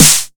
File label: 909 SNARE, Elec. Percussion; E-MU Producer Series Vol. 2; More Studio Essentials; Composer